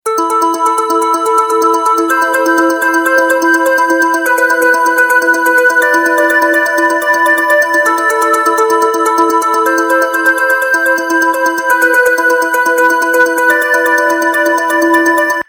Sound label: muffled mallet